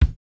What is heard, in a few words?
kick, house